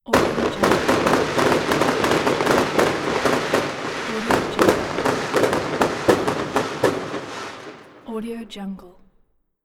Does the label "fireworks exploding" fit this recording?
Yes